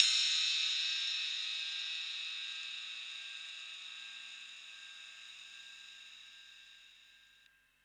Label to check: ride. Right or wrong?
Right